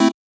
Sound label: Chord